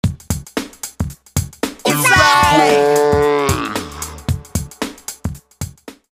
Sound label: Back Vocals